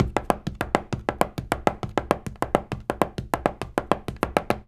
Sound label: 133 STEPS, Spanish And Gypsy